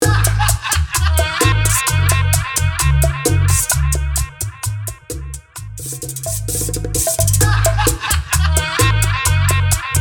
Simple Intro Outro 130 BPM

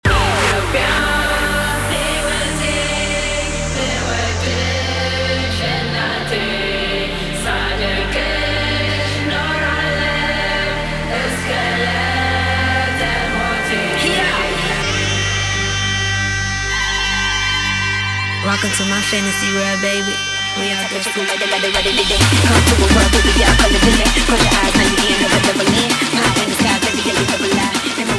intro outro 130 bpm

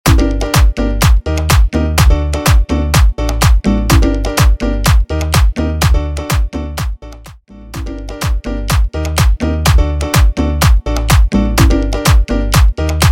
Mambo Project 125 BPM, Merengue Bootleg Remix

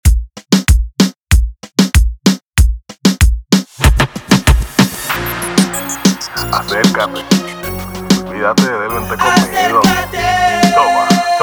intro 95 bpm

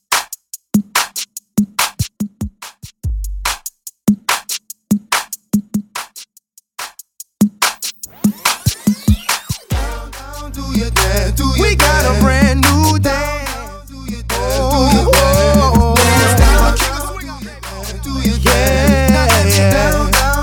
intro outro 144bpm; remixer from Nicaragua

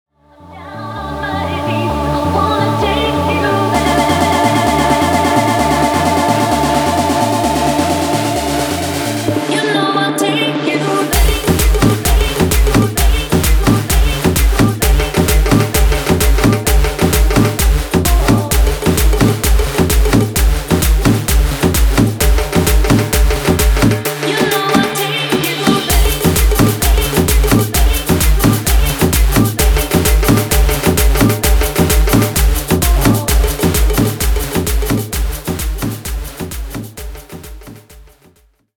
guaracha remix 130 bpm

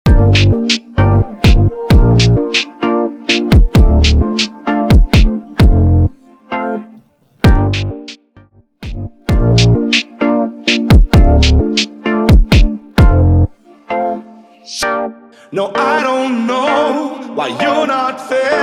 Funk Brasilero 130 BPM

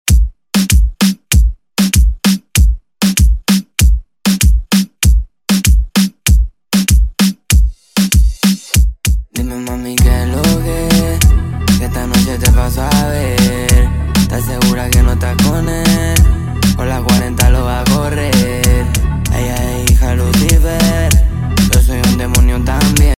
Intro 97 BPM

